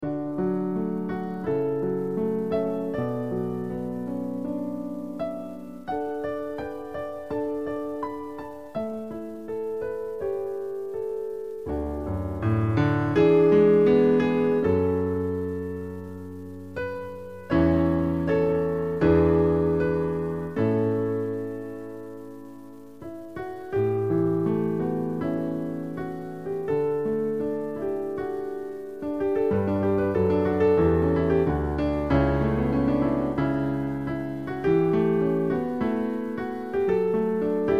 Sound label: canada anthem